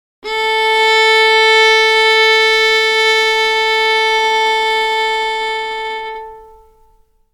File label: Tuning